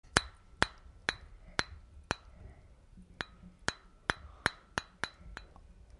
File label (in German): christbaumkugeln